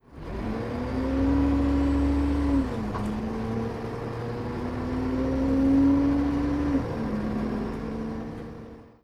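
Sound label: dennis trident, bus; Simutrans; Pak128.Britain-Ex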